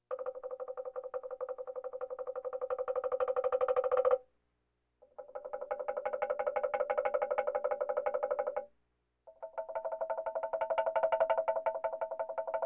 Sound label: Percussion Bongos Tympani rolls Gongs Drums